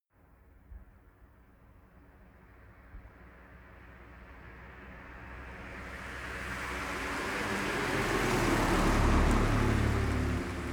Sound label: car citroen c1 drive by